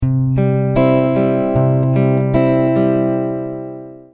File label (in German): zupf